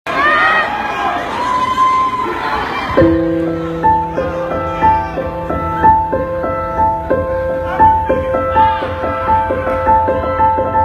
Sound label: piano